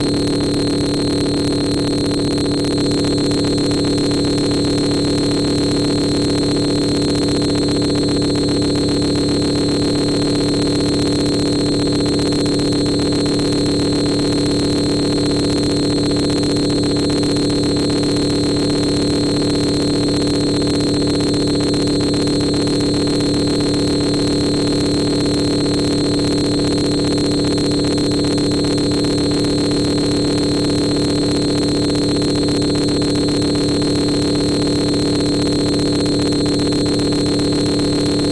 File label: signal interference; sonniss gdc